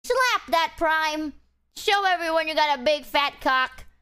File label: clap